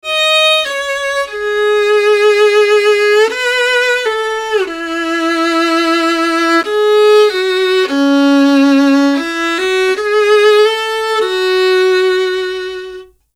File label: solo violin dry